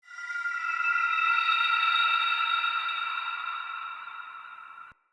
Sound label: Day Texture, Ambience